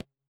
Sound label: THROAT; Spectrasonics; Metamorphosis; THROATERS